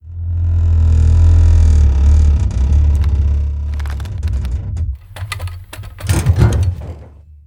Windmill Stop; moul

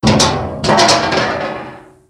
Helmet Hit; moul

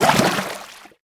Deep Water Walk

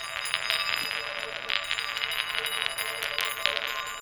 Marble Stn Roll Lp; moul

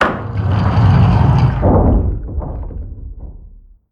Upper Room Shades Open

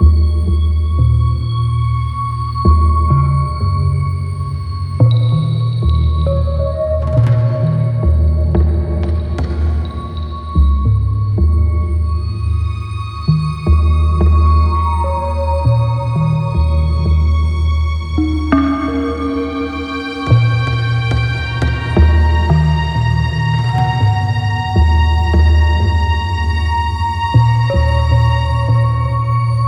Interior Music Loop

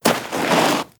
Dirt Turn

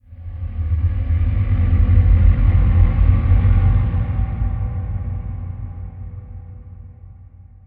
Forest Amb; moul

